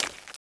zombie ghost footstep; ghostfury

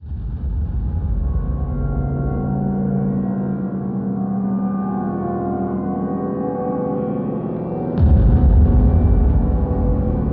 ambience

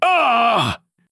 human hurt

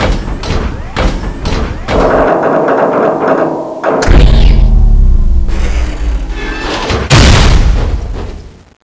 death; mad machine

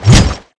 beamgun shoot

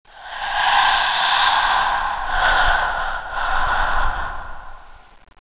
zombie ghost idle; ambience; ghostfury

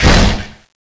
zombie ghost mid slash; ghostfury

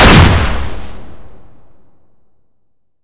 beamgun

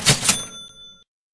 reward; hungergames

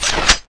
beamgun charge shoot start

